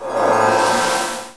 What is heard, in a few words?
Magic Heal